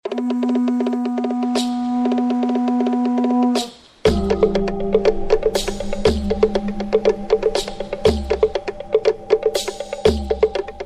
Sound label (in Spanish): sound natura sin flauta